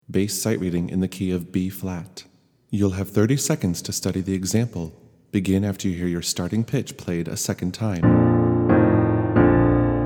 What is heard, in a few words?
Bass in Bb; Sight-Reading